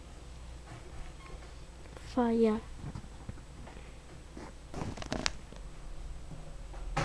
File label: fire